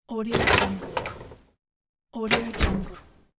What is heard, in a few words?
refrigerator door open close